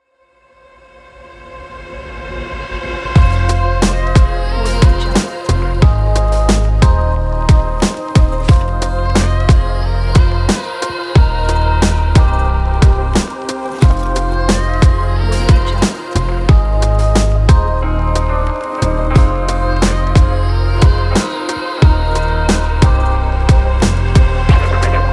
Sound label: lofi hiphop